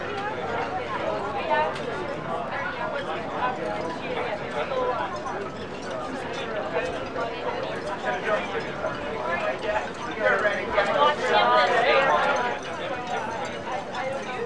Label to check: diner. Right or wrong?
Right